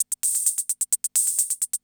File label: CR78